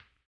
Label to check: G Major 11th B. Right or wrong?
Wrong